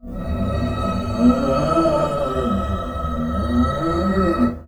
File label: String Rub, piano; Piano Miscellaneous